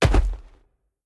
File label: tiamat bodyfall; smite